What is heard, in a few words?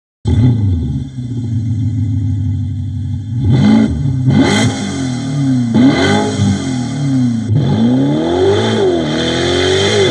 Porche panamera